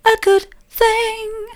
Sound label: a good thing soft, vocal